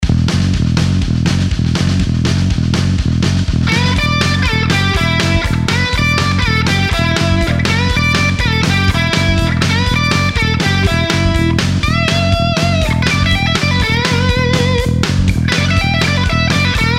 backing guitar

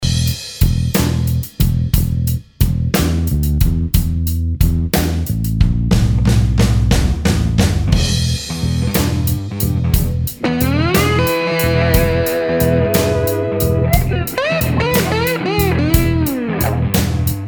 slide part 1 mix, guitar

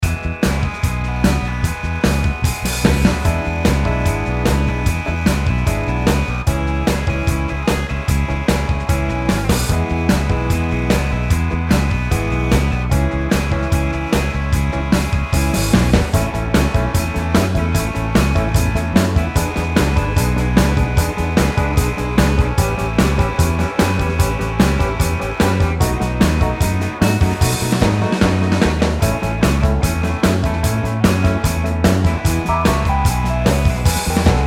slide backing

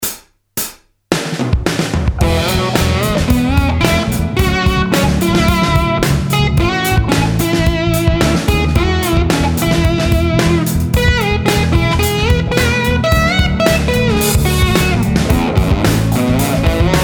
slide, mix

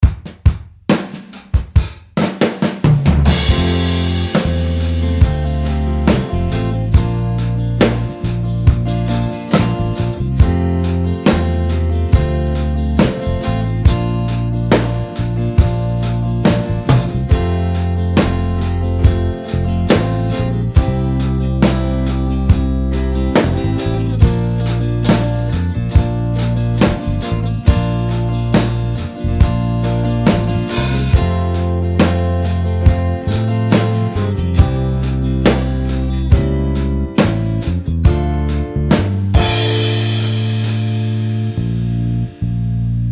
slide solo backing

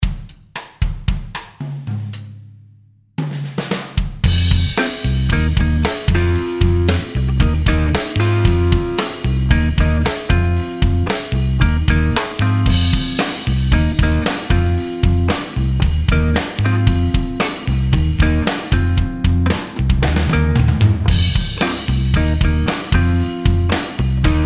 slide backing, guitar

